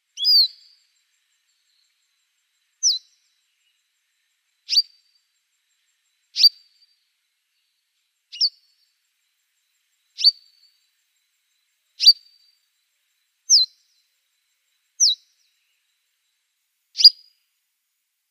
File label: African Pied Wagtail calls